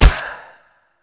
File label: Choir Bow Quick Fire; Warframe